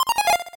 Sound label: 8bit se